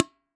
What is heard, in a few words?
Iron Bucket, IR; Claustrofobia v1.1